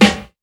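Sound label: snare, drum kits; InVision Interactive; 40 Oz Phat Slammin Hip Hop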